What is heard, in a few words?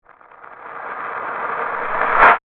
Hit Phonograph, Production, Element, Hit, Phonograph, Eerie; Hits; Blast Wave